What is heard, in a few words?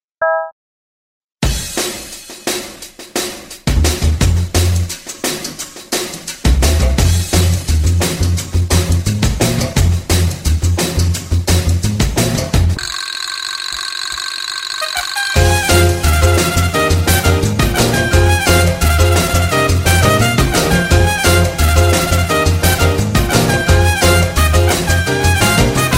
saxaphone around